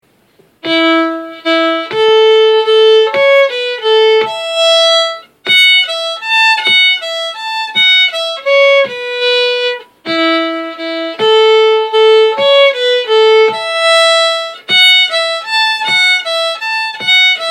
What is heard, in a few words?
John Allen Camerons Jig slow